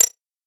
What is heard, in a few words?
psp move